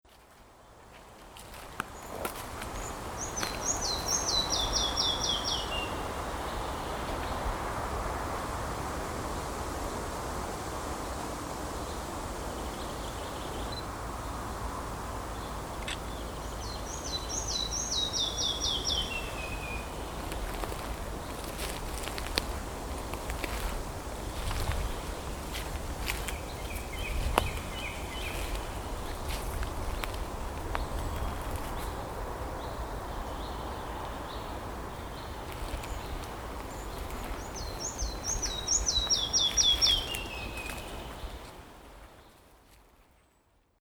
pikkusieppo; laulu; kutsu; linnut

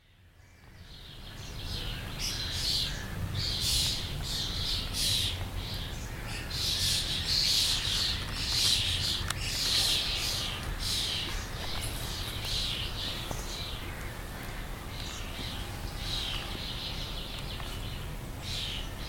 kottaraiset; kottarainen; parvi; linnut

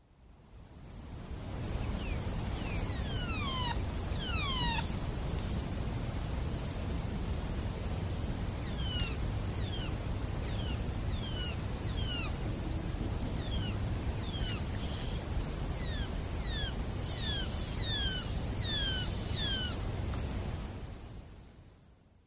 pähkinähakki; ”rupattelua; linnut

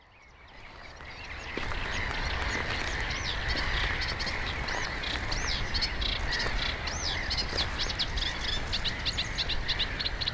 rytikerttunen; linnut

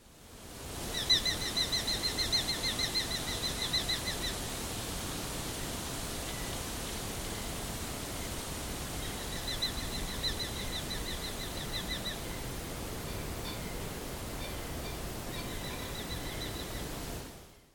tuulihaukka; Tuulihaukka ääntelee nopein tikutuksin; Haukkoja; linnut

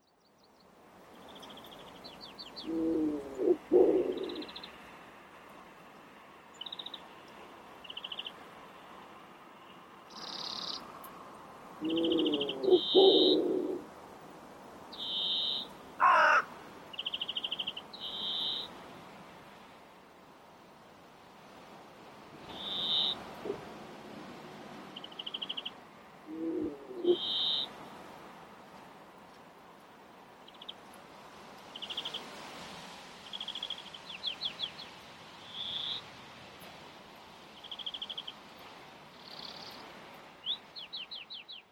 viherpeippo; linnut

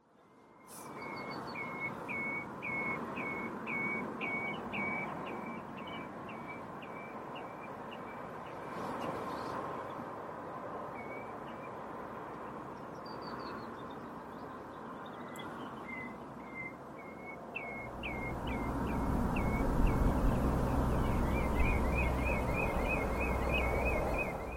punajalkaviklo; linnut

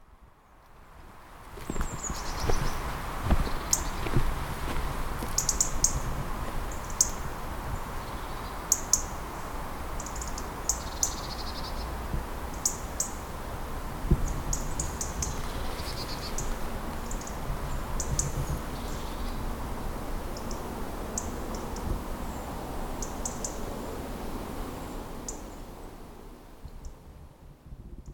punarinta syys; kutsu; linnut

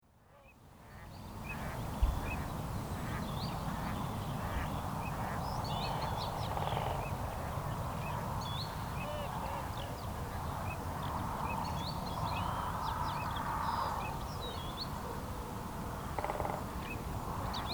metsaviklo mix; linnut

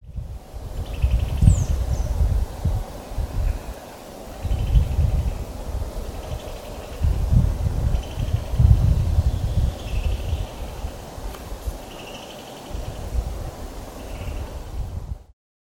pyy; varoitus; linnut

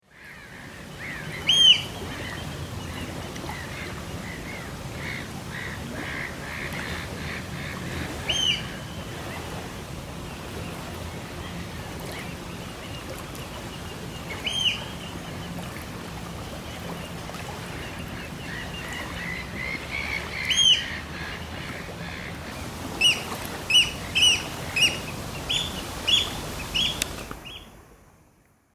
meriharakka; kutsu2 ja lento; linnut

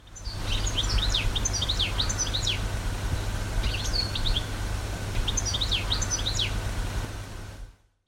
tikli kutsu; linnut

